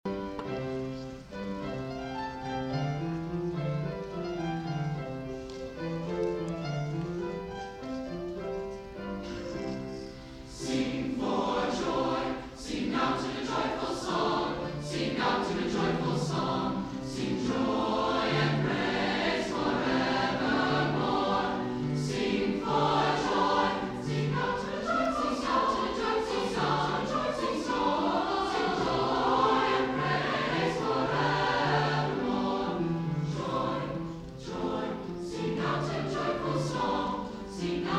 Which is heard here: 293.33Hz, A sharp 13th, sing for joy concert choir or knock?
sing for joy concert choir